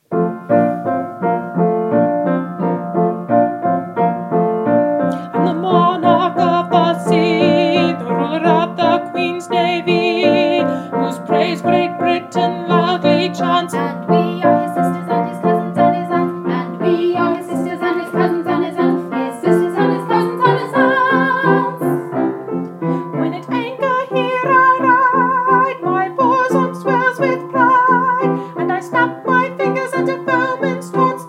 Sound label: Monarch of the Sea chorus